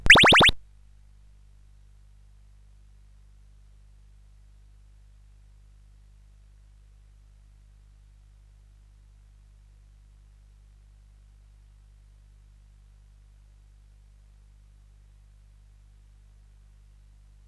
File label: Big Ben, CSG8580R5; GAMES